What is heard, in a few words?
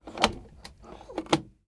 SUITCASE, Tools; AKAI S6000 CD-ROM - Volume 6